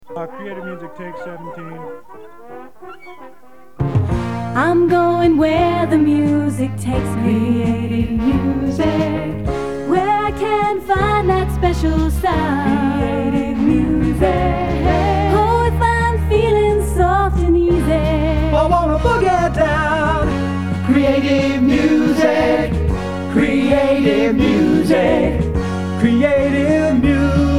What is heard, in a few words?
creative music jingle